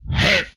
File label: Attack, Vocal; retro arcade